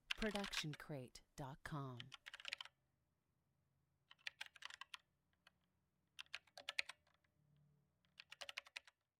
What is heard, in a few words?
computer keyboard typing words